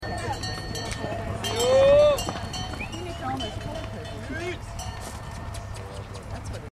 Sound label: horse sound